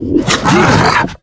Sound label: death, male zombie; nmrih; zerosiki; slender